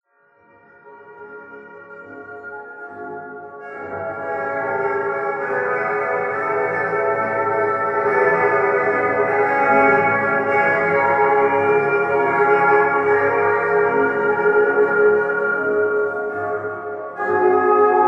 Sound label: Jazz